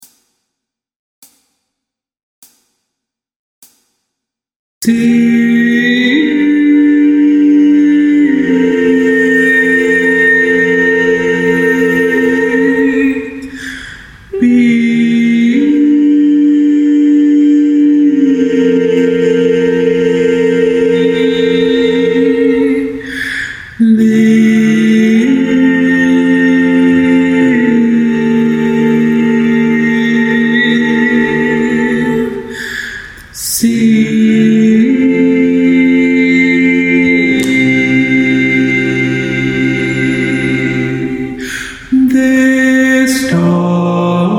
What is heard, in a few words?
tenor predominant